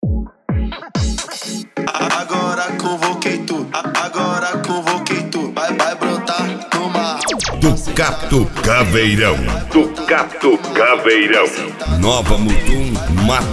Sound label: especial mega funk